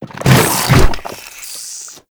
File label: Strike Flesh; Enemies; Abilities; Warframe; Proto Infested Ancient